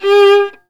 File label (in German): GEIGE; Best Service; Alpine Volksmusik